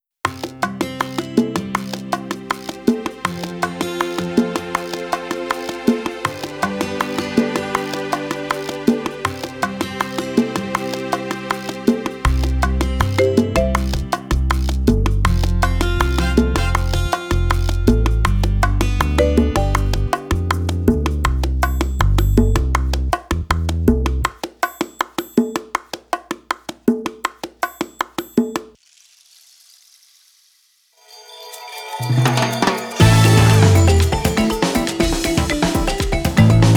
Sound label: Singing Wolf's Words; Street Fighter 6